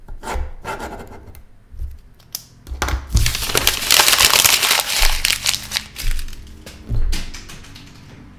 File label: paper